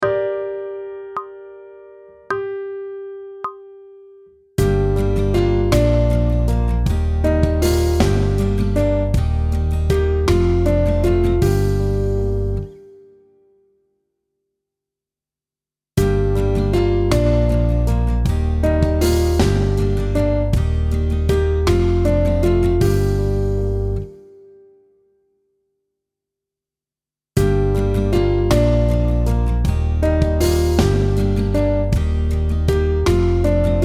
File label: aural tests harm of a melodic phrase; Grade 6; pop vocals